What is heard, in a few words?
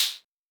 HH Cl, Acetone Rhythm-King